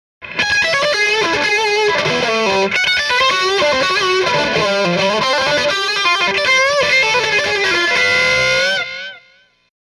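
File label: m75 lp bridge pickup dist deluxe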